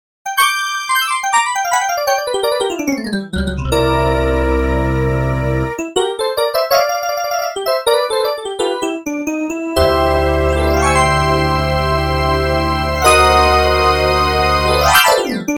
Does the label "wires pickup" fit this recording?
No